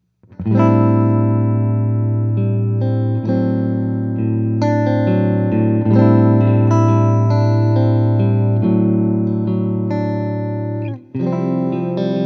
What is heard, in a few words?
Veteran 10 clean